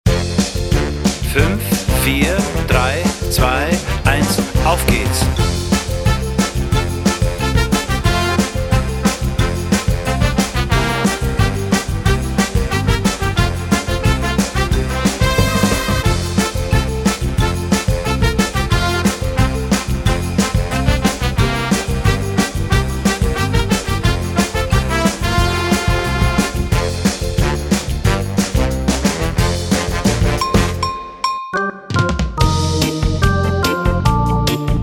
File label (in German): Amboss Polka